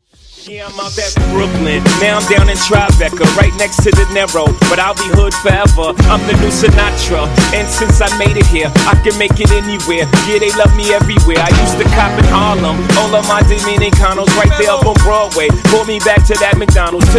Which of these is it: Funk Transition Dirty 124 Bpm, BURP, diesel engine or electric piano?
Funk Transition Dirty 124 Bpm